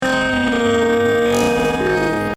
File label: electric; edge delay